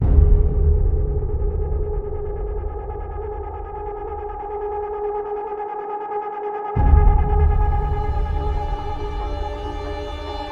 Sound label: Suspense Oneshot